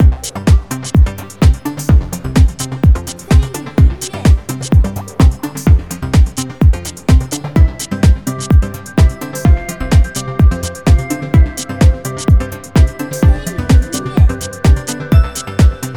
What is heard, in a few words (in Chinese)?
Q版-休闲